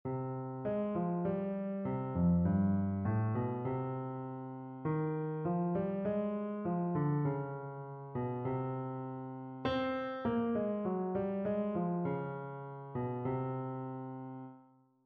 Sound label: melodic error detection